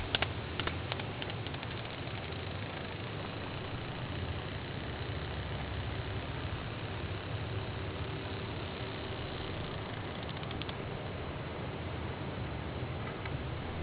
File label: creak, whale songs